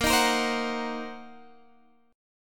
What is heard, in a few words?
Eb Minor 6th Bb, guitar chord